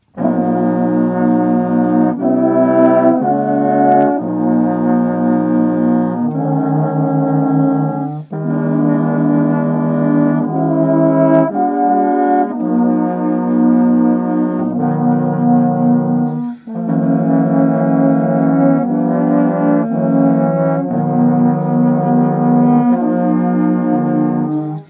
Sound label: blues